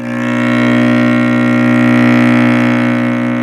cello; ILIO; Synclavier Strings

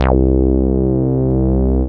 BAS, Eurotech; Sounds Good

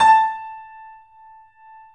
A, 3-D Audio Collection, 3D Pianos, Yamaha C7

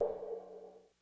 Crash, Korg DSS-1

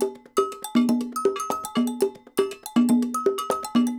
Percussion, 120; Sampleheads; NYC Percussionworks; 90 s Sample CDs

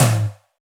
Rock Tom, Roland BK-5

